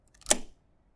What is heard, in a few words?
turn key